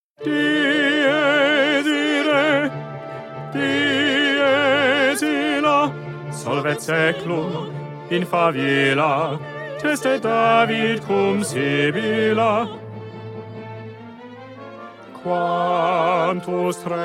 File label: Bass Dies irae; 2020 Mozart Requiem